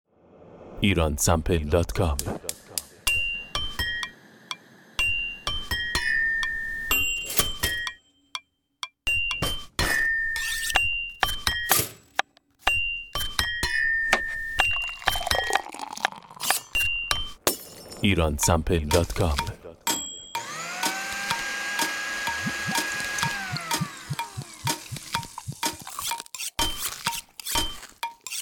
kitchen

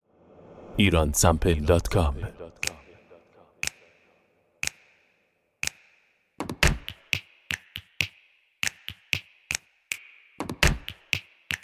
snap

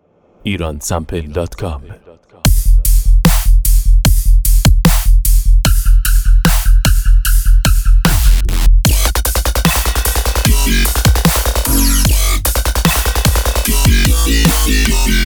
Dubstep; dubstep loops

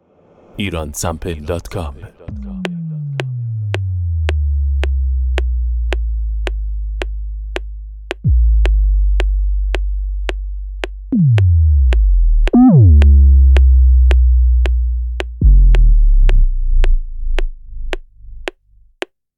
sub, sub drop

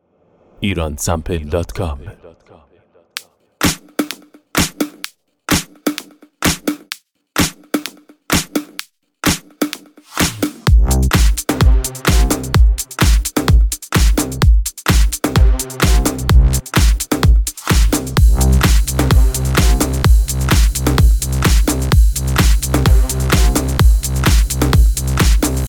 Edm

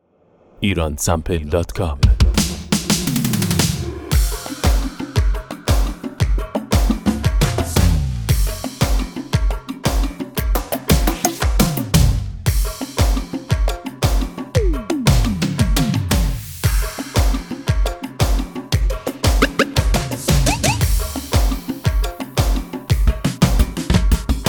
fill 6 8; fills 6-8

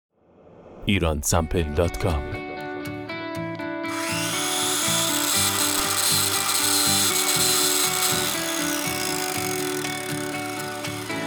tools sound effect

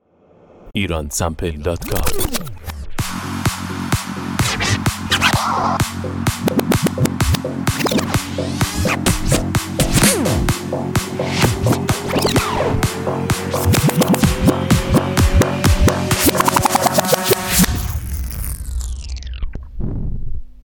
scratch

